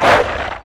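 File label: Npc Skeleton Wolf Damage; FlyForInsanity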